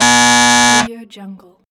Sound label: buzzer